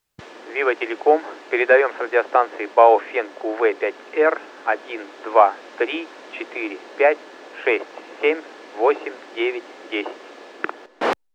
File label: baofeng uv 5r tx